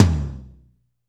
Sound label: TOM M R, Drumscapes Roland